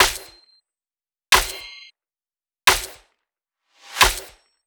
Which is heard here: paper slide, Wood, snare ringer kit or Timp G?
snare ringer kit